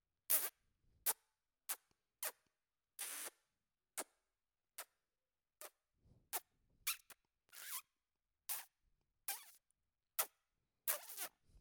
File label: Kisses